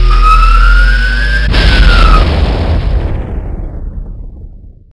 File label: shock fire, weapons; quake2; railz